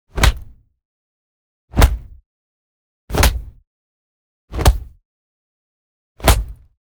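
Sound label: Punch Processed Punch Medium Big; The Sound Keeper; Combo Pack Sound Effects Pack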